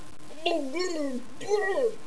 drown; quake2; penguin

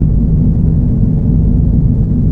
alien bridge; quake2; zepsounds

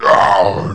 pain; quake2; brawn

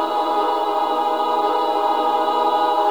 choir cluster loop women; quake2; scary

